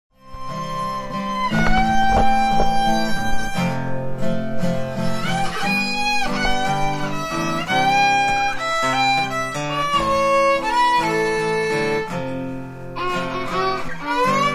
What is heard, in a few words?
Slow Fiddle; Revenge; MindMenders; CATHOUSE TUNES